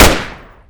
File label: Gunshot; weapons